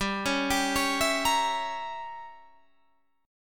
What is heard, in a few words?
G Diminished 7th, Guitar, Standard; arpeggiated; JGuitar